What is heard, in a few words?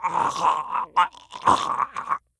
die zombie; cstrike